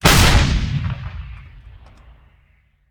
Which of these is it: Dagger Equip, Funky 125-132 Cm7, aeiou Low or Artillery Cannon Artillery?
Artillery Cannon Artillery